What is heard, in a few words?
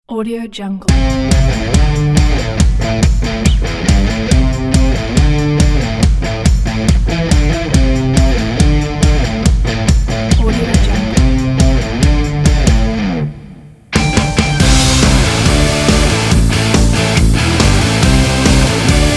energetic dance rock party